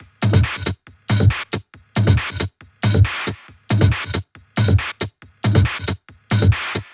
138BPM Kit; VFH1 138BPM The Epic Kit

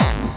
BD Distortion, Bassdrums; Vengeance Samples

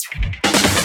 Loops Fills; Vengeance Samples

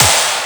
Claps

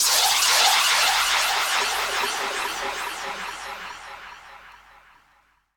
Downlifter

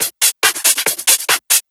Breakbeat; Vengeance Samples; VEC2 Loops

